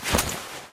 ak74 draw

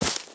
bush, human step

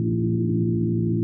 device hum; ambient

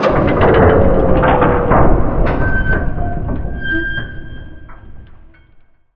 bridge stop

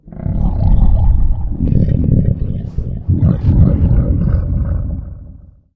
boar, outdoor ambient

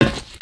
metal plate, human step

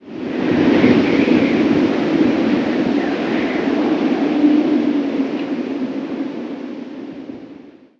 dark wind; ambient; outdoor

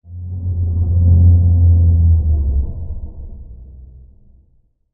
moan, ambient outdoor

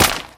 impact weapon small earth; collide; small-weapon

